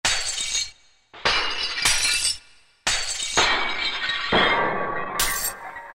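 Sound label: smash glass; Latin And F.X. Percussions; Roland SN-U110-02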